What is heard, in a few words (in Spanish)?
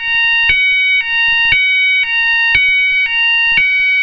tono